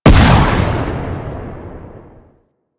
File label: Arachnoid Camper Missile Barrage Explosion; Warframe; Corpus